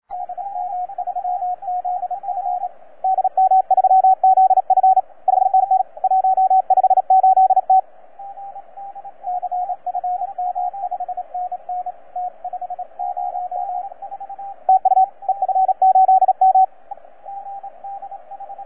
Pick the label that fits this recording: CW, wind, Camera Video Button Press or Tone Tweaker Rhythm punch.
CW